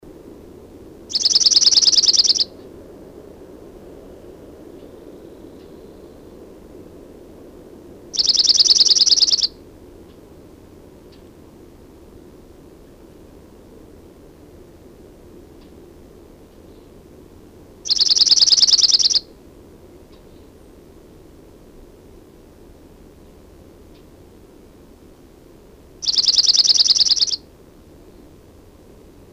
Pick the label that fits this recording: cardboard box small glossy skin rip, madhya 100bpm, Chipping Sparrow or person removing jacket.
Chipping Sparrow